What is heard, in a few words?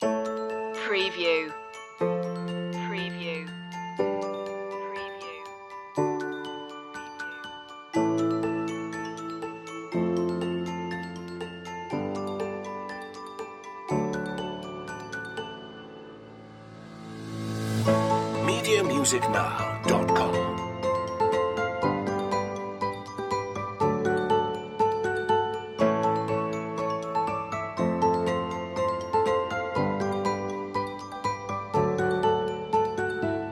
bell shoppe loop